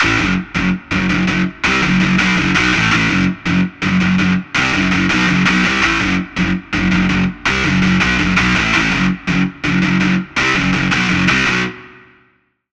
Lead; Routed Sound; Spire Expansion - Flame Summoner Vol.1

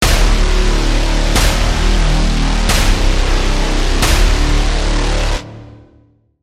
Braam; Routed Sound; Serum 2 Expansion - Quantum Enforcer Vol.1

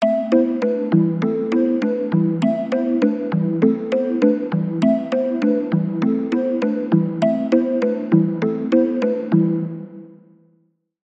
Pluck; Routed Sound; Sylenth1 Expansion - Street Vanquisher Vol.1

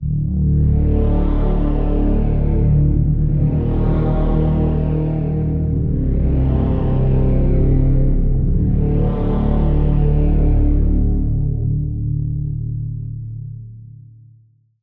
Pad, Serum 2; Routed Sound; Serum 2 Expansion - Reality Maker Vol.1

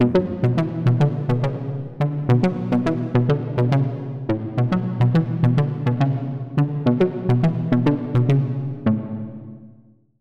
Lead; Routed Sound; Serum 2 Expansion - Noble Gangster Vol.1

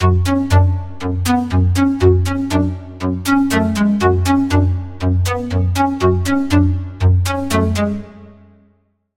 Lead; Routed Sound; Sylenth1 Expansion - Street Vanquisher Vol.1

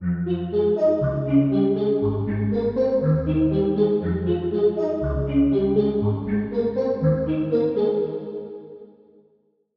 Vox; Vespers; Routed Sound; Vital Expansion - Story Walker Vol.1

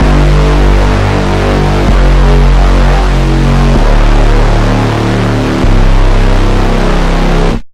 Bass; Warp Drive; Routed Sound; Vital Expansion - Star Scavenger Vol.1

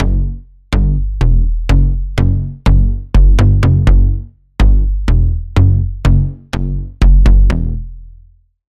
Bass, Sylenth1; Routed Sound; Sylenth1 Expansion - Noble Gangster Vol.1

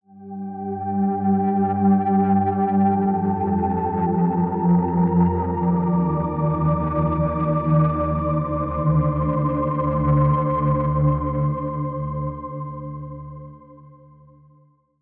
Pad, Sylenth1; Routed Sound; Sylenth1 Expansion - Quantum Enforcer Vol.1

